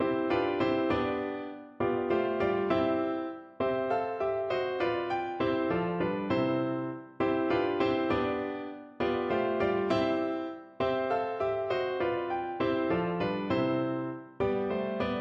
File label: bagatelle c minor, piano